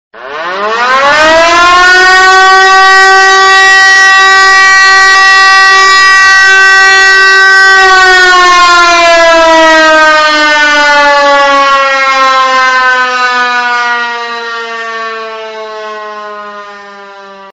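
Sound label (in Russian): звук сирены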